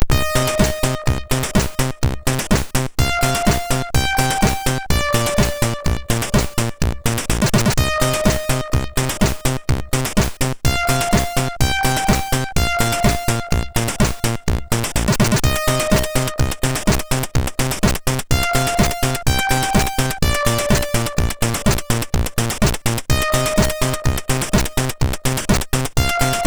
Aquc, sid MOS6581R3

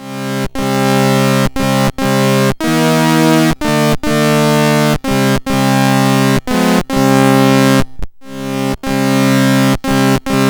Detonation, CSG8580R5; Stephenson John

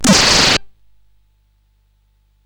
Shuffle, CSG8580R5

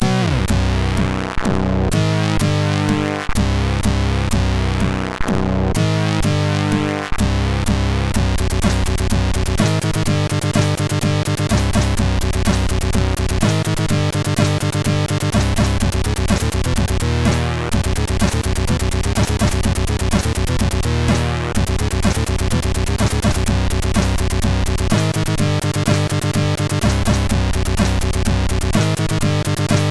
Death Metal, MOS6581R3

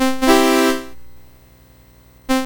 BASIC sid MOS6581R4, GAMES; Sword of Fargoal

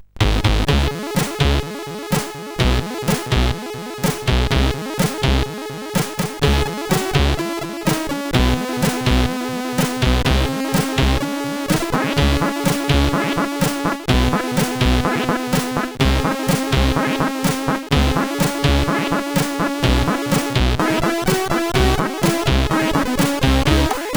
sid, CSG8580; Tape Me

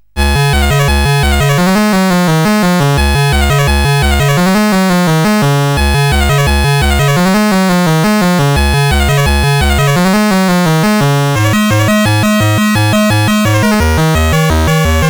Musette II, CSG8580R5; Music Shop

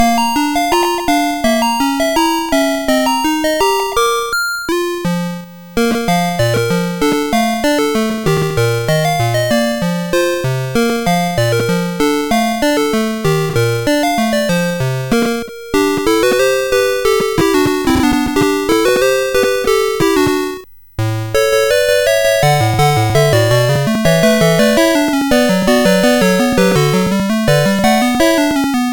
Musicbox, sid MOS6581R4

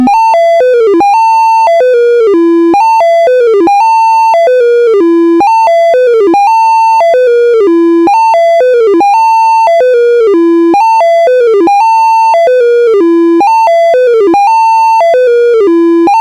Blues, CSG8580R5; 128 Byte Blues BASIC; hvsc

